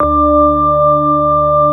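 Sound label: CX3 organ; USB Soundscan vol.09 - Keyboards Old School; AKAI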